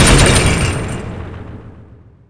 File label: turret mgun end stereo